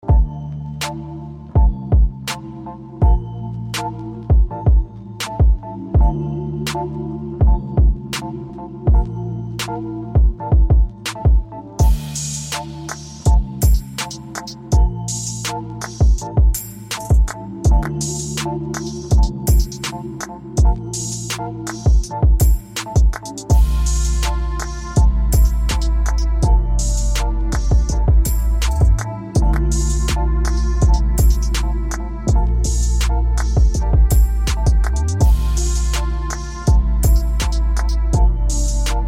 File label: hip hop rap old school beat 82 BPM